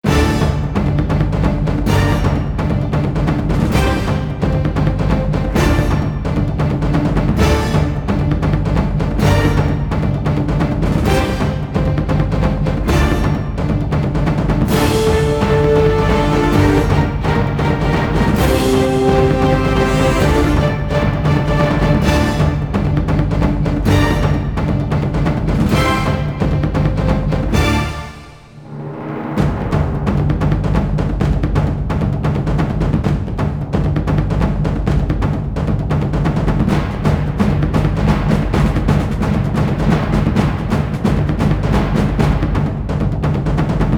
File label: Drum Drama; d.i.r.t. origin of the species